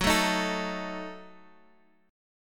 F sharp Minor 6th F sharp Guitar Open G

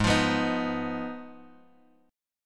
G sharp Minor 6th G sharp; G#m6 Chord {4 X 3 4 4 X} strummed

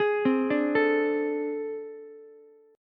A Minor Major 7th Double Flat 5th, down, Ukulele; arpeggiated; JGuitar

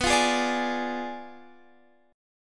B Diminished 7th, down, Guitar, Open F; strummed

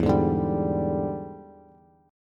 E 7th Sharp 9th Bass, chord; E7#9 strummed; JGuitar

